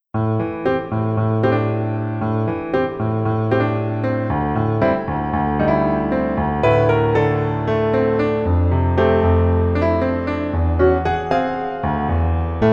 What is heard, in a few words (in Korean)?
Piano ver -1키